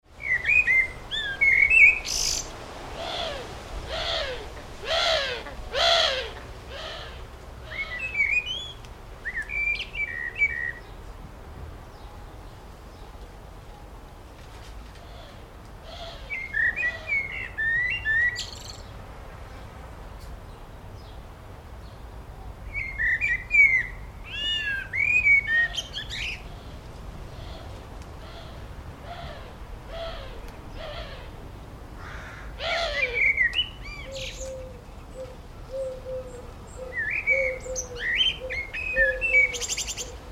Birdsong In A Village Garden, various birds - wing flaps; Bird Sound Effects